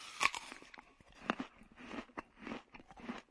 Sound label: eat; mtrp inventory